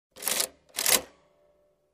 Typewriter slow reset

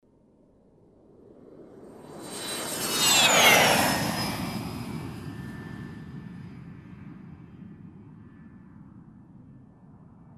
Aircraft Flying by whirring sound

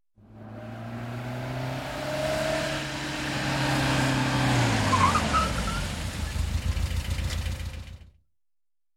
Pickup Truck Approaching and Brakes

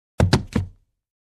Carton Boxes fall down

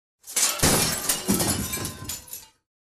Big Glass Pane Shattering

